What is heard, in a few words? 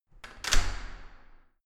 entrance door close normal